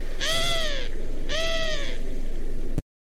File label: turkish dove scream